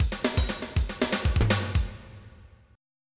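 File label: 16 beat fill; TWIDDLY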